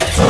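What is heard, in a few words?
engine, sound canvas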